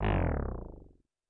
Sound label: Roland TD-20 Percussion